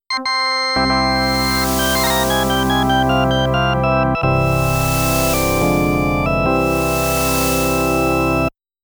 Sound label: Round Clear; Rolling Thunder